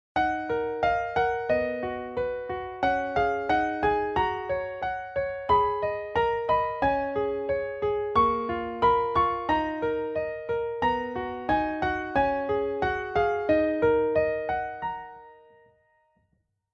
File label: Piano solo, ringtones; Lenovo P780